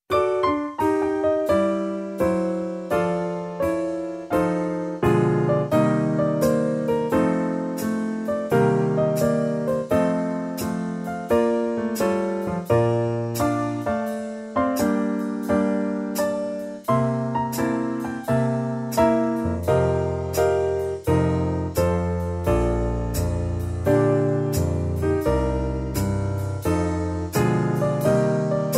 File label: Flute